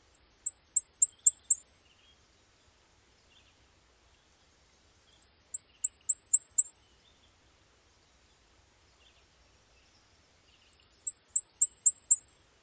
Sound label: songs; NY